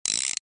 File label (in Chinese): 扳手拧螺丝